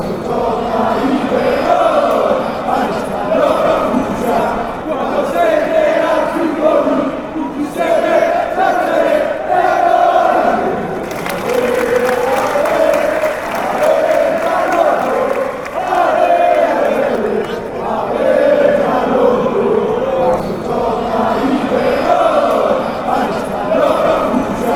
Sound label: ale, fan chants